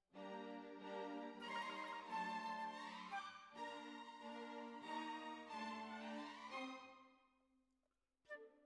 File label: Flute